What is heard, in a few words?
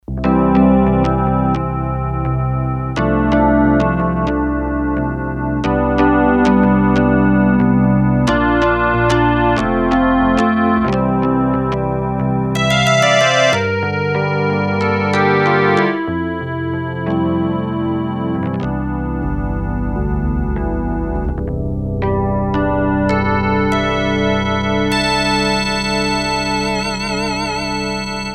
Piano, Korg DS-8; Factory Patches